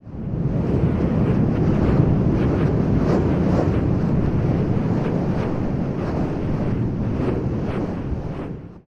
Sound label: whoosh env